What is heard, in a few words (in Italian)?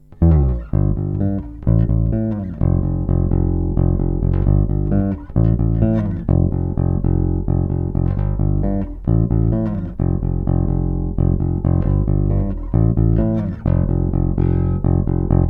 jba54 manico dita aperto